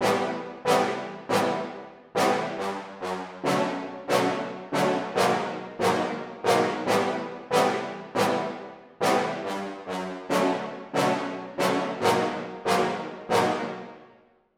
Stabs

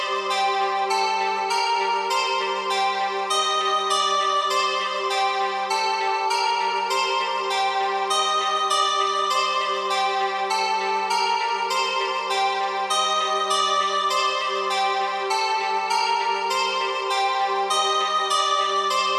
Synth Lead Loop 100bpm E; Zenhiser

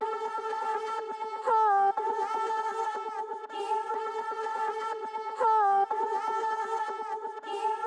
Vocal Chops Dry B min 122bpm

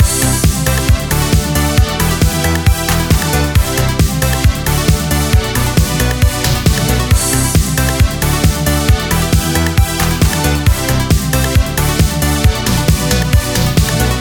Full Loop 135bpm D; Zenhiser

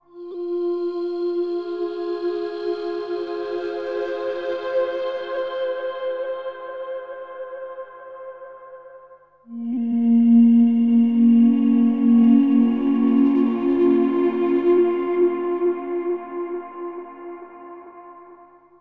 Loop Meditation Pad Vox

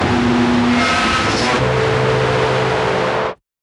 Dark Fx

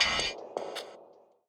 Fx Hit

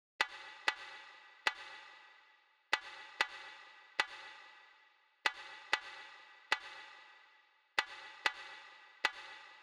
95bpm Snare

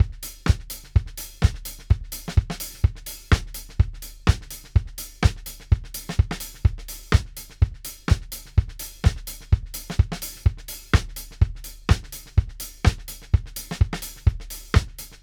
126 Live Drums Pulse

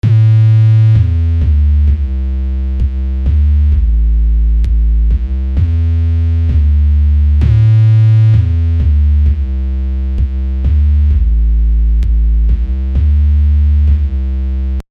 SUB BASS

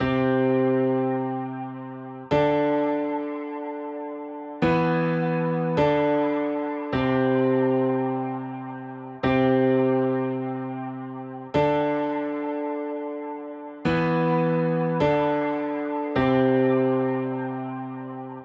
Piano 104 Emin Wet

